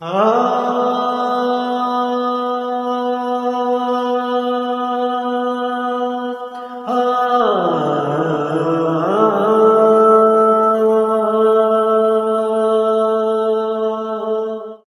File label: Male Indian Chanting